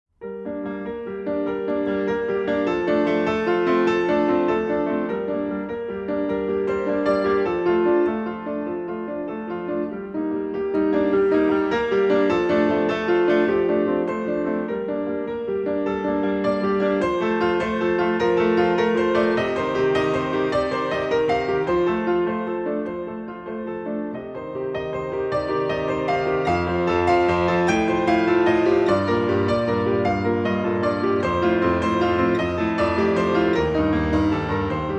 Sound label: Solo piano